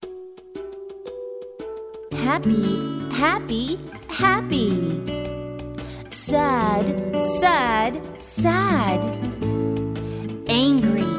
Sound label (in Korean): 영어 여 챈트